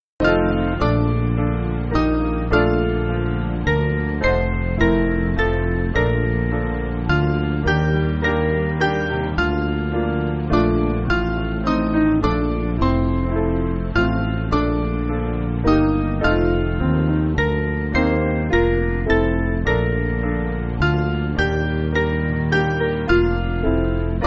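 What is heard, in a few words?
Piano, Music Backing; Before Thy Throne; St Peterburg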